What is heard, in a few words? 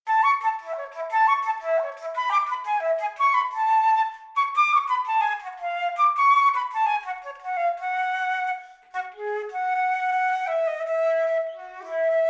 giga flute; Handel